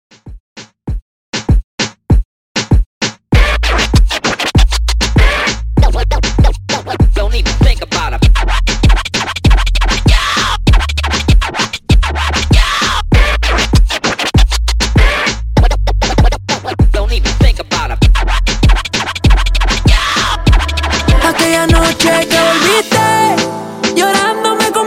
Funk Scratch Redrum 98Bpm; Clean Dirty

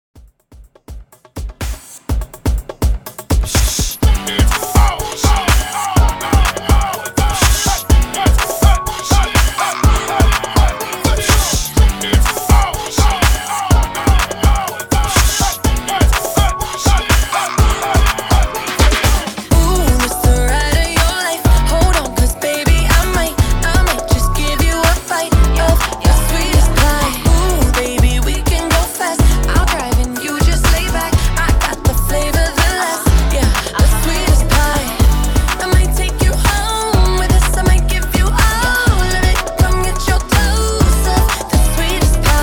124 BPM

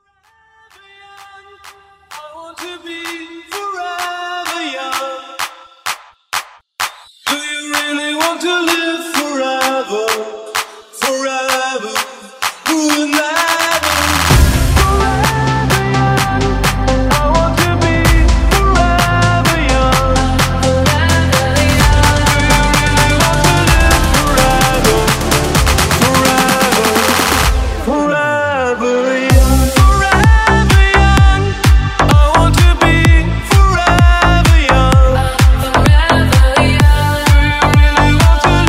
Clap A Pella Starter

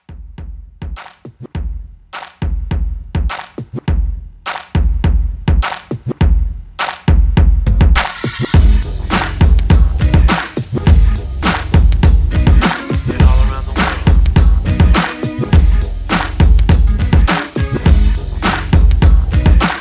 90s Hiphop Old School Re Drum 102

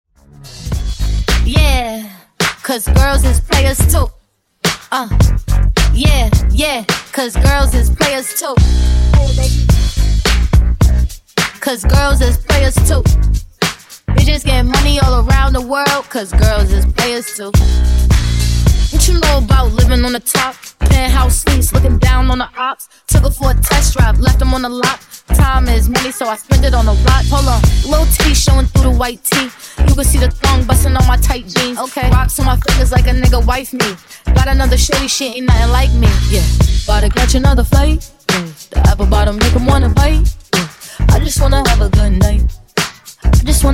Funk MashUp 107Bpm

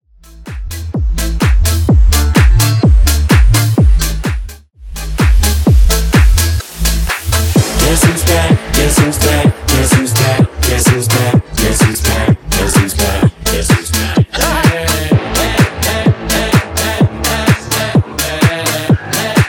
Remix 127bpm

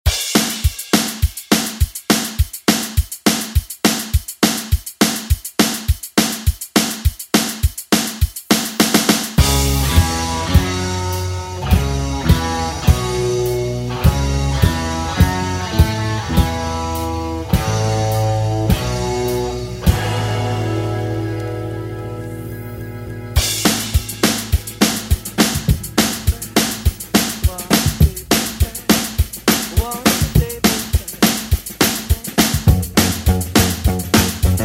70s ReDrum; DJ Mhark

